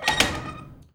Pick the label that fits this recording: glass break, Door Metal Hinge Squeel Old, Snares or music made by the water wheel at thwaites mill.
Door Metal Hinge Squeel Old